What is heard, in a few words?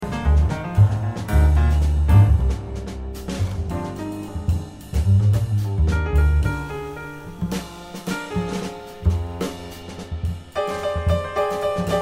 trio Blues